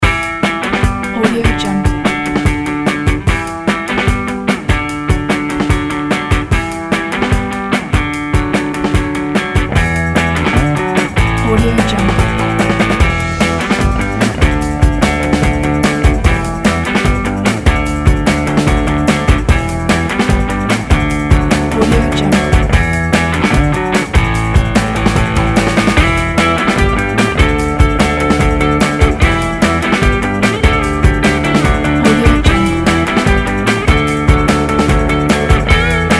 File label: groovy indie rock